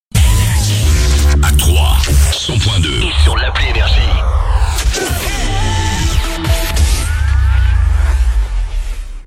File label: JINGLE; TROYES, 100.2 FM; NRJ